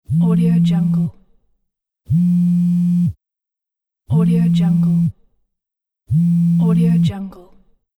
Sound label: phone vibrate